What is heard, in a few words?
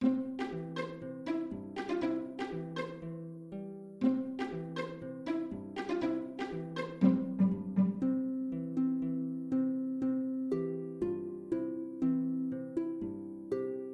strings